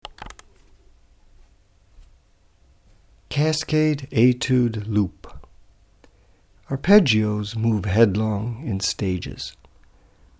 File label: Cascade Etude Loop